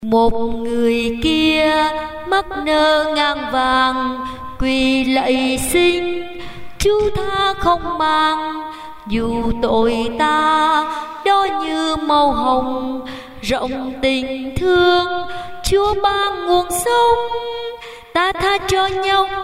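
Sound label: soprano alto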